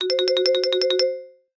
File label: comedy marimba flutter or shake long low pitch; sound effects one